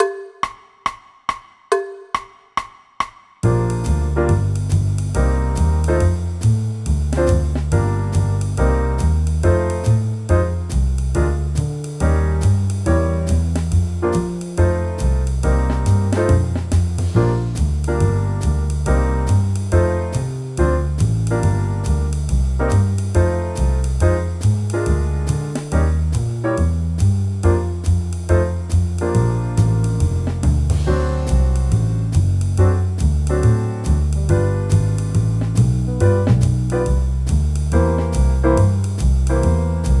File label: Senior Tenor Sax Playalong